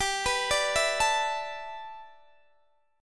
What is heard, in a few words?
G 6th Add 9th G, Guitar DADGAD